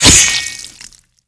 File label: sword hit; The Monster Plane; kolmods melee